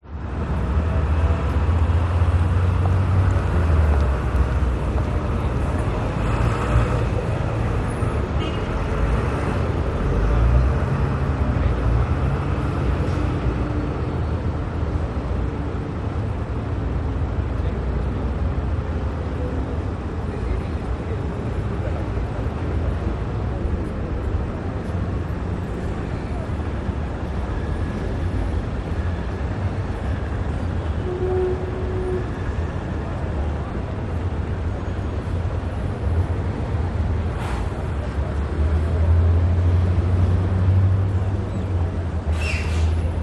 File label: Bus Station; audio hero